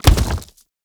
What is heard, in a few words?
Arm; Warframe; ManInTheWall